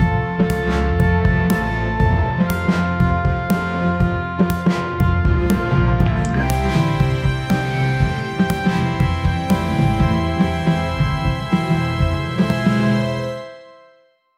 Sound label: mysterious and slightly